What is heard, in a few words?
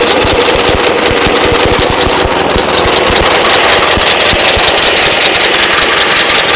buick sound, car sounds